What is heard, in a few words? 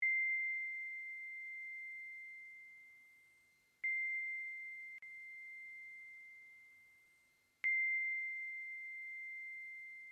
broadcast, noise